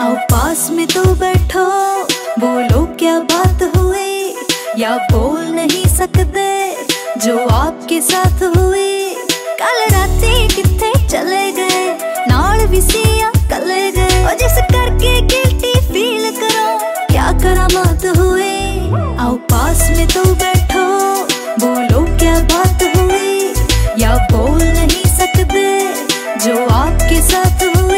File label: Female Version Ringtone